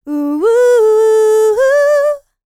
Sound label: LICKS, Vocal, R&B; ILIO; Dance; LT R+B